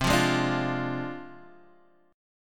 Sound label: D Minor 7th Flat 5th C, guitar chord